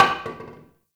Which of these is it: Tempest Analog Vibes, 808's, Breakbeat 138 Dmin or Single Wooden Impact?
Single Wooden Impact